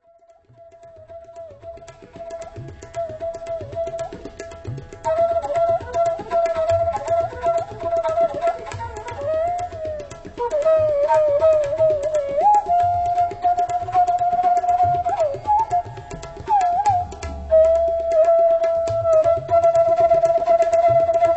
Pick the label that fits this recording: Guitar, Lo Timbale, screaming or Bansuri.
Bansuri